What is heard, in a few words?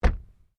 Door Close, car; Hyundai Accent 2008; Hyundai hire car; Vehicles